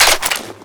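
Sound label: weapon foley m4 reload clip out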